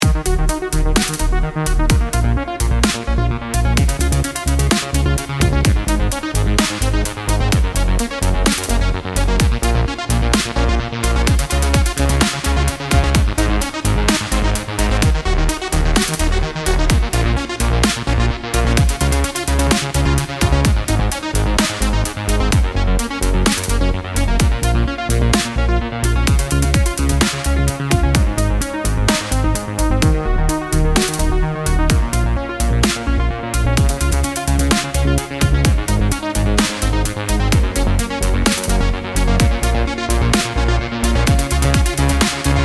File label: fat saw lead